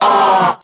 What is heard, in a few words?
"ah" group of women